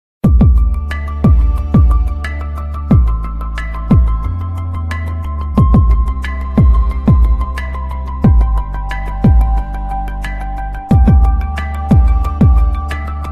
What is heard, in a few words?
blackberry bold ringtone